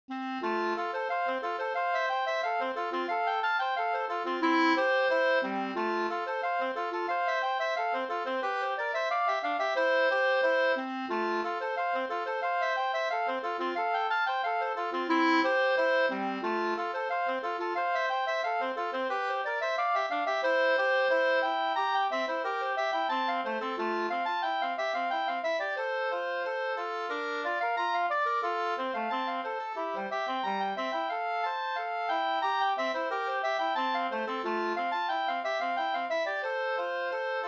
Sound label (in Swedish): folkdans, ril no; Opdalsril 2st